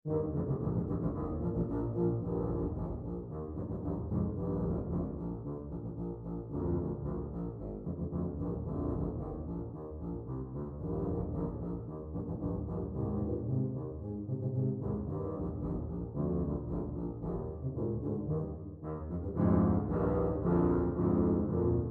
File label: Polka; Peckle